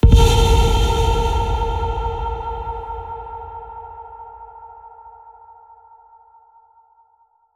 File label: Ping, Trailer; Federico Soler Fernandez; Effective Trailer Pings